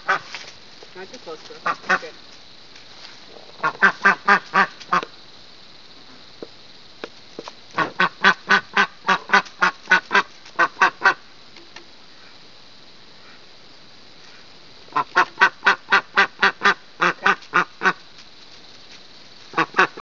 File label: girl quack